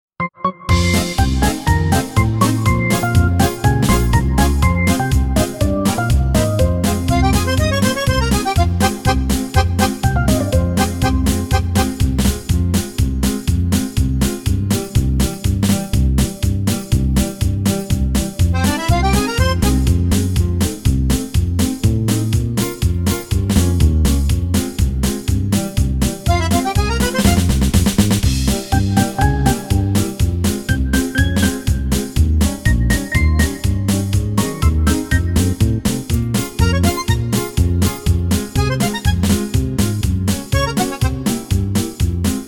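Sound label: minus F minor alto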